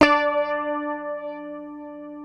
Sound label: PICKED, Bass, D4; Sampleheads; Will Lee Bass Library